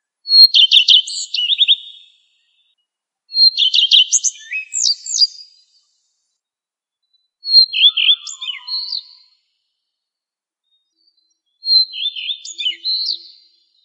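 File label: common redstart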